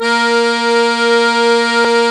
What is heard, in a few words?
a#4-d5, Accordion